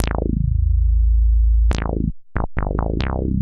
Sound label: TECHNO BASS 140 A